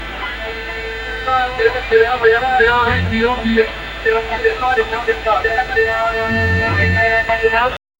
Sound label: rare 1985 vhs recording o, tv